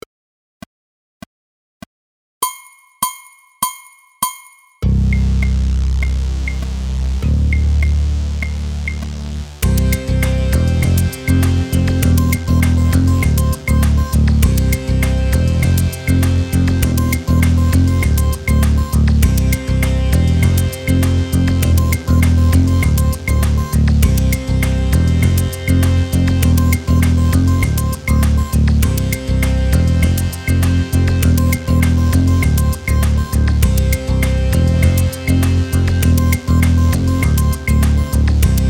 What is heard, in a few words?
baion buzz unissons loop